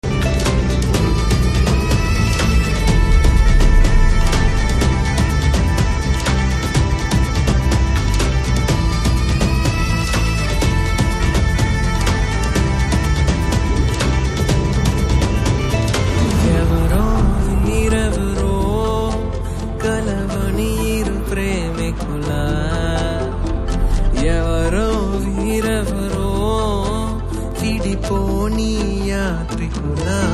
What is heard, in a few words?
bgm ringtone